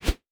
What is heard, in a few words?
Whoosh; Fantasy Sounds Bundle